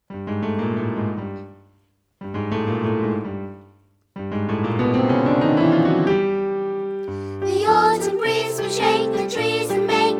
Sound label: Blues